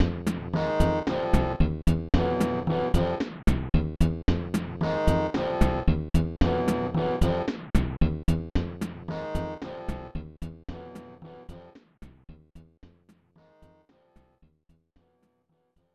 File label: Desert Land; Super Mario All-Stars